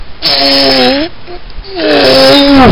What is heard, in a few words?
ORGASM